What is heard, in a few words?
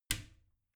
bathroom cabinet creak open regular; objekte; tueren